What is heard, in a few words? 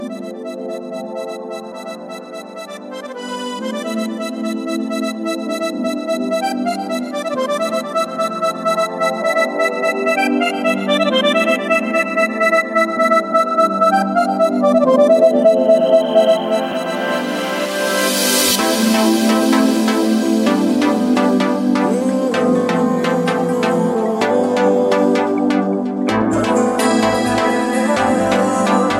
Music